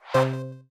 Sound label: game show quiz bonus move